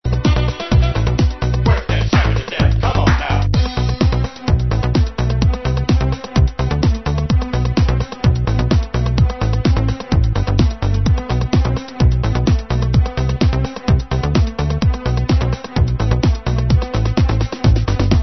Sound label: bass; bass bumpers